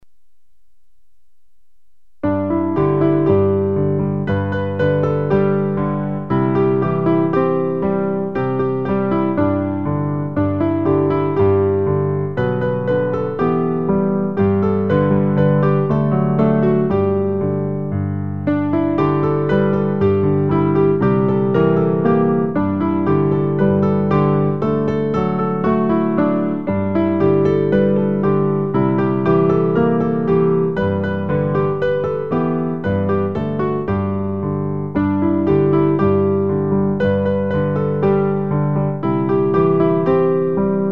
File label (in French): une flamme en moi piano; recueil Louez Dieu